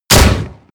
shotgun close shot

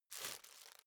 plastic bag rustle slow

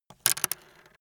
pencil drop

